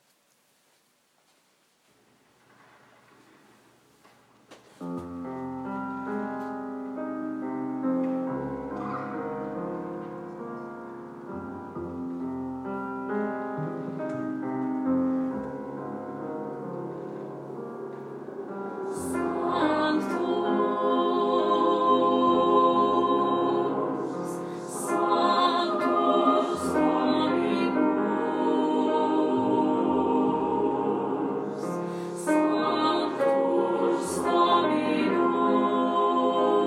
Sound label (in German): Alt II, Sanctus; Messe; Chor